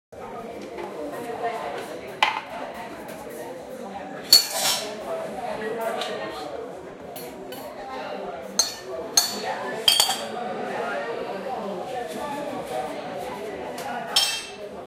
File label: the sound of people clink, dining